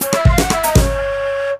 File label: Card Lose; Dillon's Dead-Heat Breakers; Nintendo 3DS